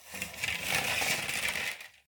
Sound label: paperdoor open close